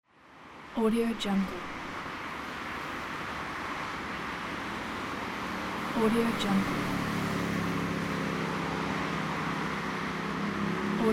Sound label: city traffic